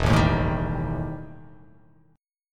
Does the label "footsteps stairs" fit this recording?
No